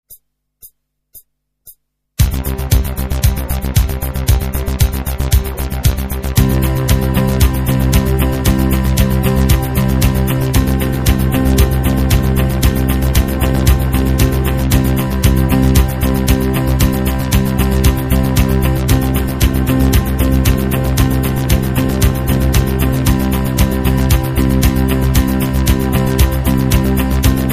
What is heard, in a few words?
Sin Sin Sin; klang-fabrik